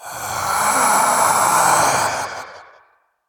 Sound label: Spirit of Vengence Death